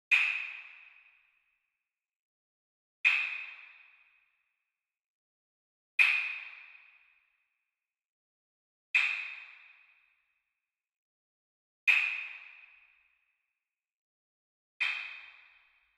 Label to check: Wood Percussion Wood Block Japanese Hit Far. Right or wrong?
Right